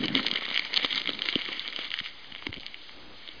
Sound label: fire; Monster Media Number 15